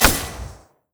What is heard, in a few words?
Shen ki attack; LoL; TPAShen